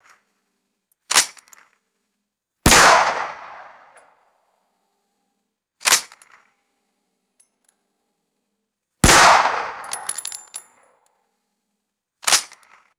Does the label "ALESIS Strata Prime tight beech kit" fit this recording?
No